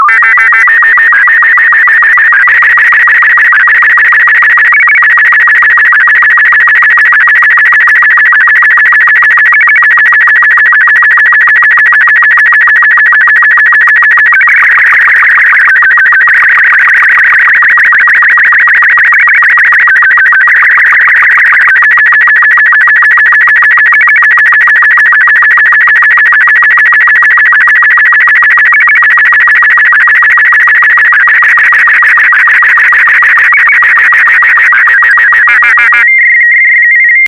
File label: sstv; R36; VK4MSL